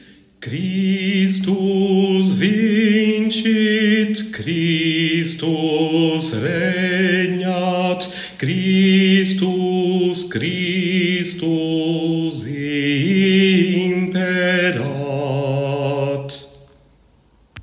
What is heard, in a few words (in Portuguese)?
Final Alto